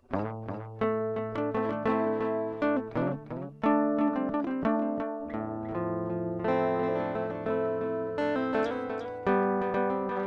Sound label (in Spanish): Sample Guitarra Delay